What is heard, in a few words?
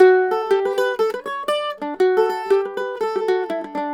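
120 mandolin, Celtic; MANDOL120; USB Soundscan vol.42; Celtic Flavours; AKAI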